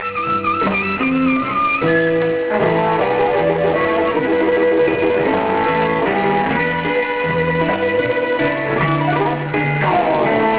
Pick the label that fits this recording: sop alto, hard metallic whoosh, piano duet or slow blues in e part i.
slow blues in e part i